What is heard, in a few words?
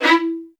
XST1 E4, LOUD VIOLINS; Q Up Arts; Denny Jaeger Master Violin Library